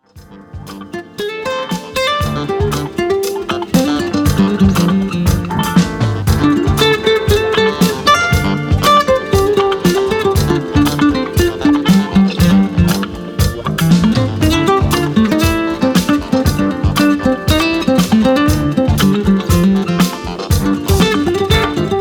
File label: fusion